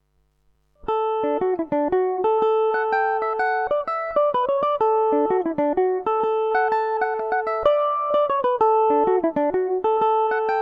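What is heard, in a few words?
slide D major revelation, irish mandolin; The Gleanntan Slide; Revelation Jaguar electric mandolin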